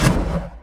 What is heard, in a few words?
Explosion Fire; KriptoFX; Realistic Effects Pack v1